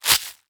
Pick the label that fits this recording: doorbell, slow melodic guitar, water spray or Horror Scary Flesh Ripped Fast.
Horror Scary Flesh Ripped Fast